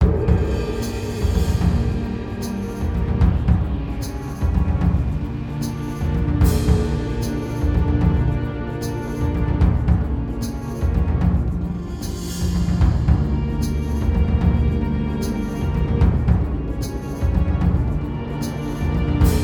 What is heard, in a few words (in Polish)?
Wulkan Walka z Bossem; jet kave adventure